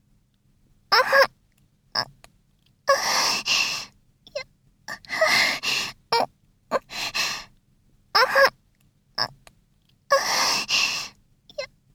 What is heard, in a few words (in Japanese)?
Hな喘ぎボイス, ASMR; 20分耐久陽乃のHな喘ぎボイス